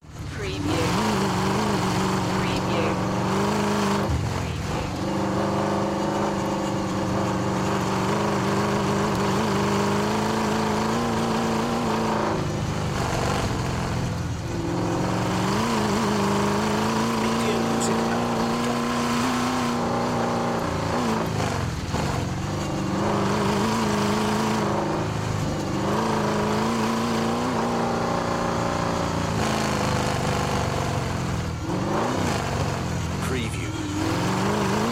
Chevy V8 Hot Rod Long Sound Engine Medium Driving Around